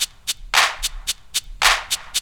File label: LOOP; E-Lab; X Static Goldmine 2